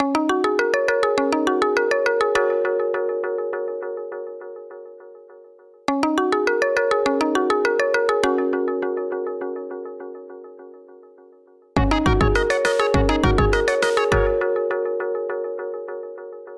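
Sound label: japanese ringtone sms funny melody sms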